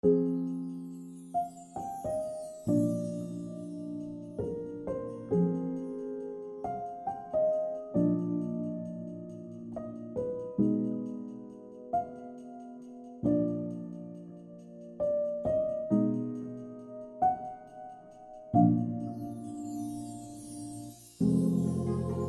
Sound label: orch xmas